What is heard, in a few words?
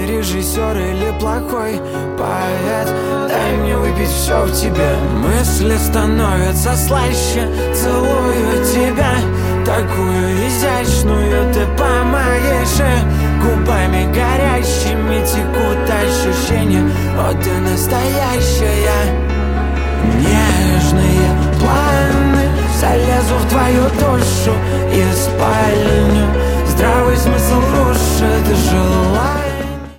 acoustic version